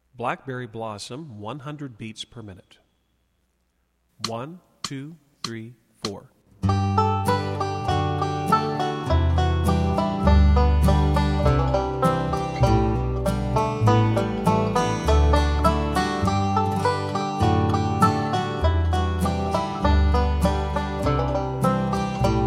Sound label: Blackberry Blossom 100bpm Solo, banjo